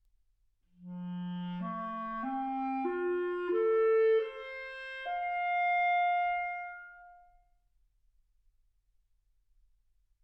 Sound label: clarinet